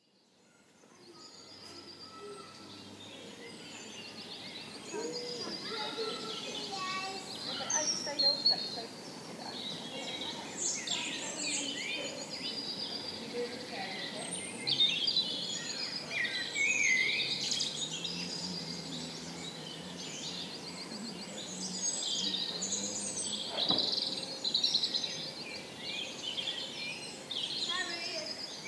Park Soundscape

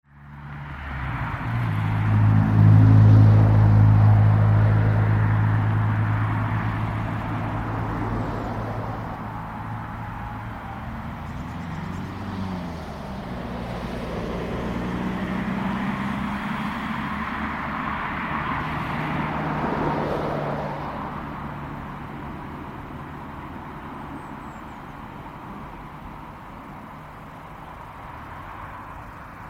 Penclawdd Soundscape

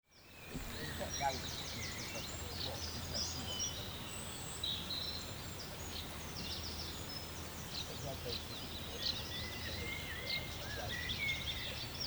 Distant Voices

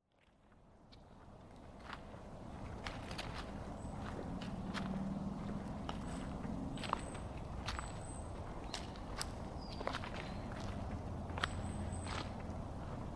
September Forest Footsteps

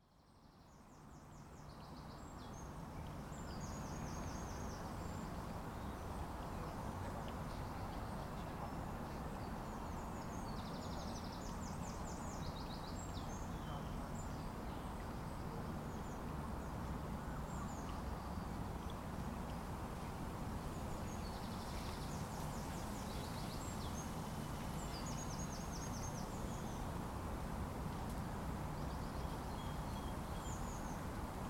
Swansea Botanic Soundscape

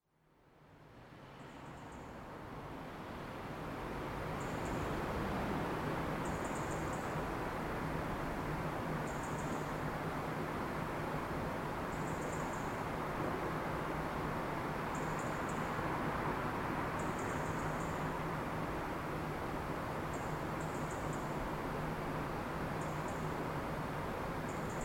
Urban Dawn Walk Soundscape

